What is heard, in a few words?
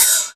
Cymbals HH Open; Vengeance Samples